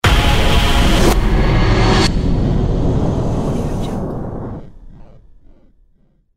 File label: revers impact hit